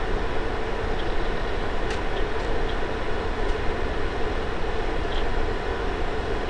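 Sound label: tech ambience; dissension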